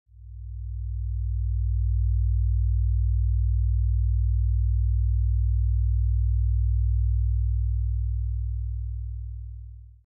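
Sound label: Frequency 60, 60 Hz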